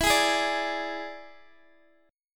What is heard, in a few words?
E 7th E down Guitar Open D